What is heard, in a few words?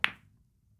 hit, billiards; monkey2; PoolMod